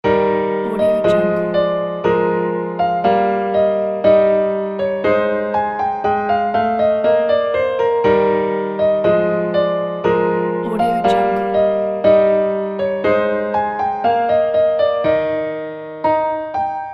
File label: mozart piano air in e flat major